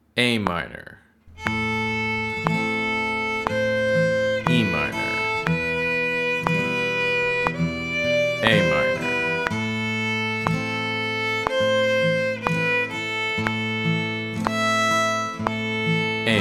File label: slow with chord cues; The Goddesses